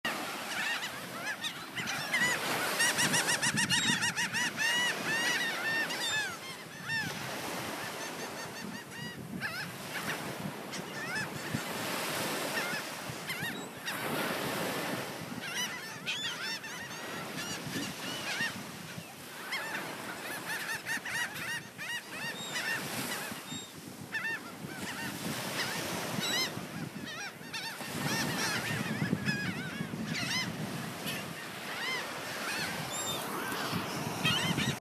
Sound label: Laughing Gulls; coast of maine